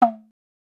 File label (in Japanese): 小鼓; 膜鳴楽器; 打奏; 大鼓と小鼓